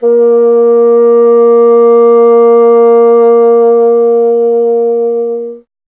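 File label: wind bassoon A; Miroslav Vitous; Solo Instruments VOL-1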